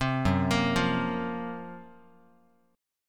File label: F sharp Major 7th Flat 5th, Guitar; F#M7b5 Chord; arpeggiated; 3,2,1,3,x,x